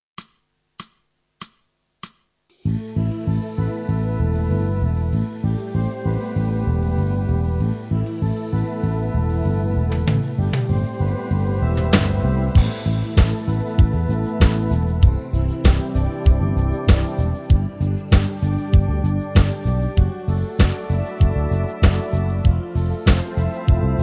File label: Long Notes Full Backing, Rock Metal; Wim Kueter; The Rock-Metal Book